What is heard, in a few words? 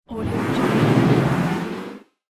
cartoon swoosh